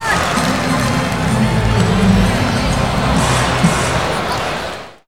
crowds

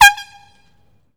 toot; whistles Horns Bells

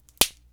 snap, breaking

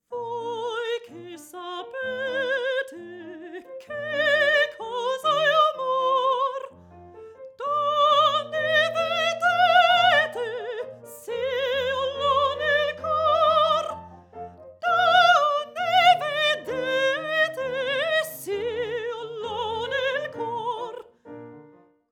Vocal Spot Mic V4U, Piano and Singer

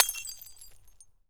glass small, breaking

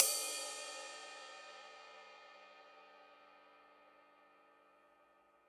Ride, Live Trip Hop Drums; Drum Samples; Smoke Signal

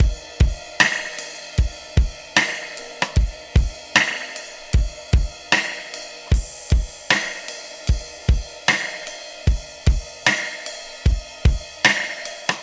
76 Processed Drums, Live Trip Hop Drums; Loops; Smoke Signal

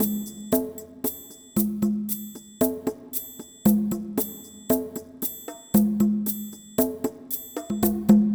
Latin Lounge Beat

